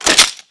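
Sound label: weapon shotgun double reload open; doom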